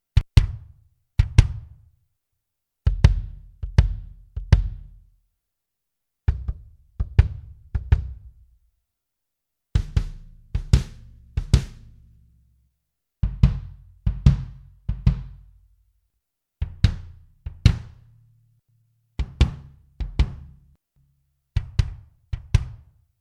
PEARL MimicPro Kick Drums

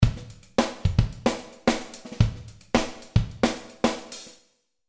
Chamber Drums

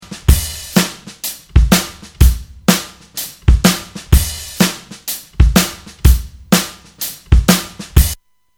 Acoustic Drums EQ

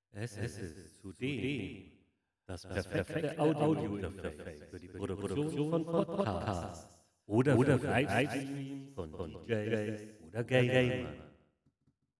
Presonus io44 Slap Echo

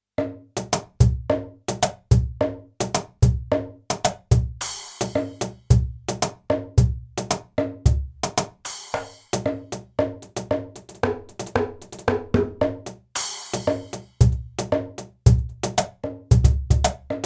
KORG MPS10 Cajon Kit

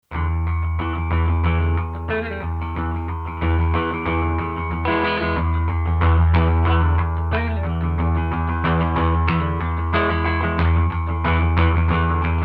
slam

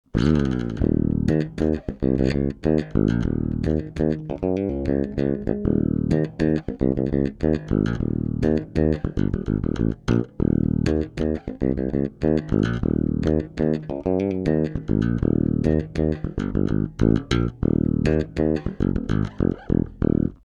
EBS DI SKC Fingerstyle

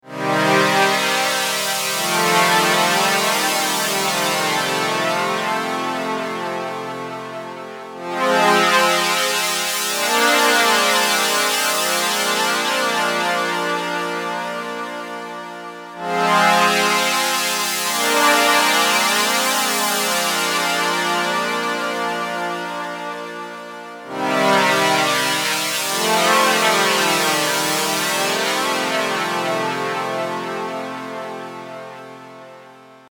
Bandpass Mode1; Xerxes

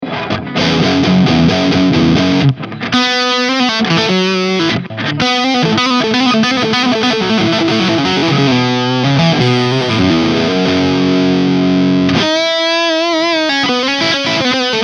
Rocktron Mainline Dist

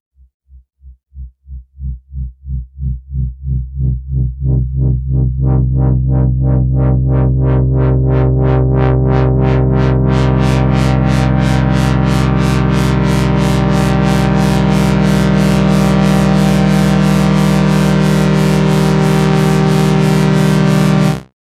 Roland JP08 LFO Pad

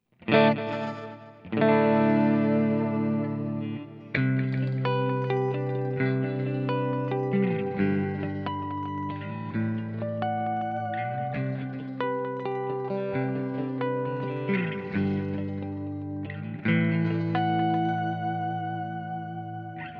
GFI System Orca Delay Ambient

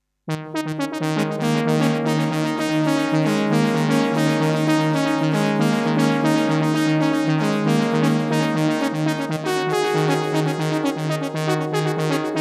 Roland JX 08

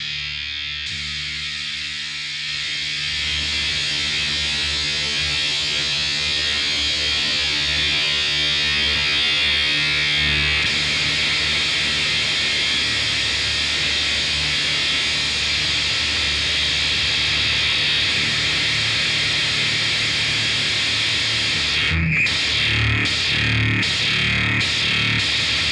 Ultrawave SA 250 Midscoop Extreme Distortion; Source Audio

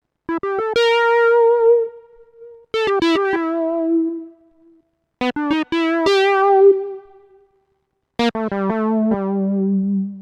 Numa Compact 2x Synth

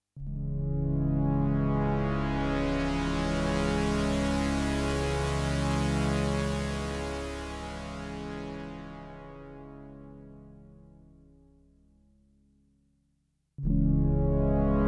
Filter SEM Lowpass Reso 0 Reso 5 Reso 10

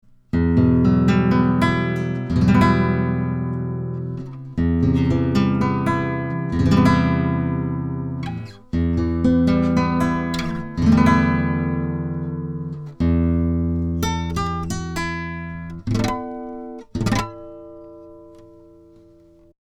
tf47 Nylonstring Fig-8; telefunken

